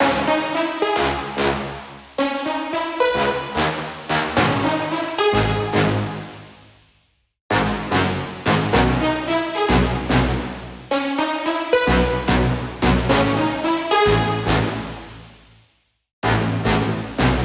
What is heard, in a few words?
Brass Loop - 110BPM - C#min; 99Sounds x Monosounds; Instrument Loops